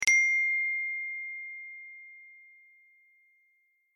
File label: Note, alerts